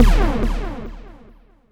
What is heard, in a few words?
Synth Hit D